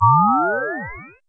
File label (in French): bruit; MOBICLUB